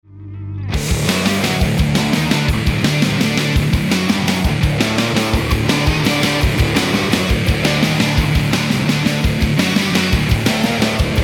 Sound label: Metal Arabe